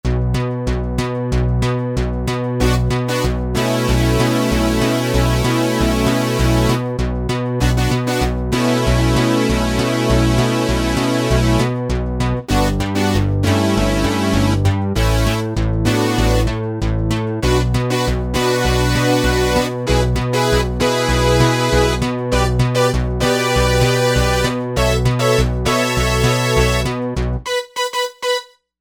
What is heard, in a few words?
Brite Synth Split